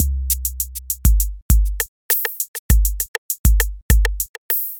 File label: 808 Pop n Bass